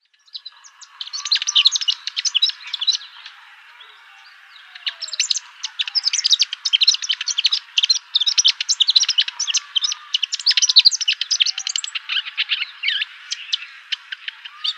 Palestine Sunbird song

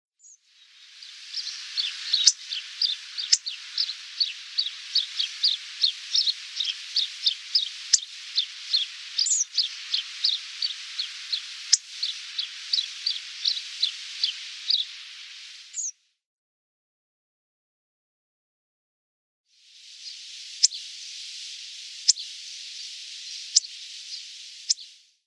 Hawfinch calls

